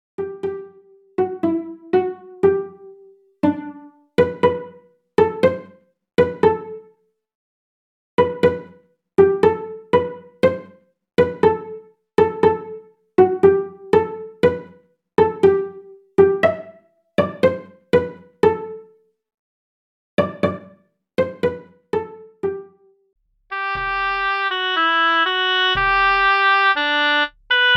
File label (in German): 1-stimmig; Altes Reiterlied; Der Mond steht in den Gassen